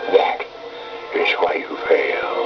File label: fail; starwars